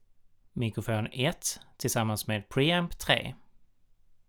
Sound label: mic pre